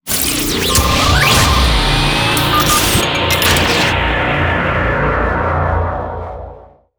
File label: Logo; Space Engine